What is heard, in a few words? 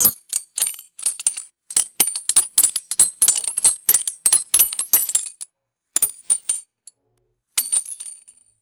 small metal ammo casings clinking; bullets